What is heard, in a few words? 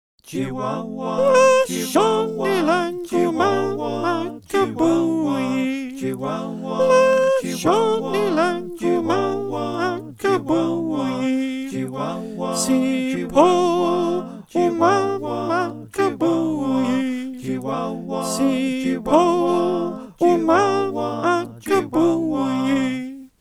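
soprano, choir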